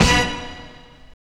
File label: HIT, Drum & Bass; Ueberschall; Drum & Bass Flash Trackz